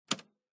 household stereo hifi tape cassette deck stop button